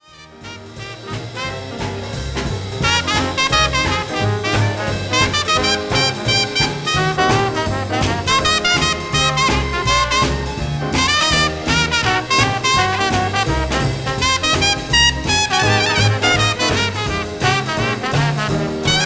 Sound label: trumpet